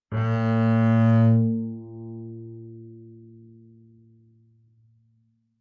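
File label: Bass arco fortissimo sul G Bb2, Double Bass; MIS Pitches - 2014; Strings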